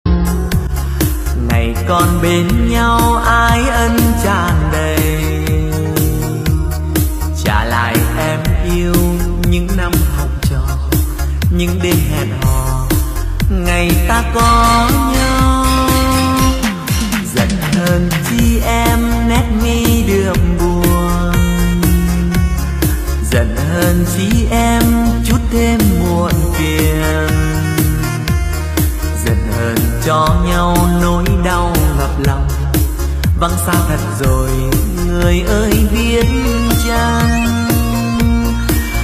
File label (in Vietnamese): Nhạc Sống Rumba Nhẹ Nhàng Êm Ái; Dễ Nghe Dễ Ngủ